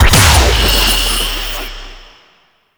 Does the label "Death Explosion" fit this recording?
Yes